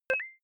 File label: query glug beep